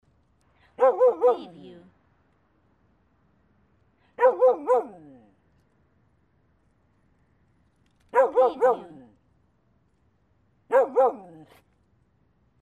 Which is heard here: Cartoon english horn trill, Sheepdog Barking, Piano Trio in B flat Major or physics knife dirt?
Sheepdog Barking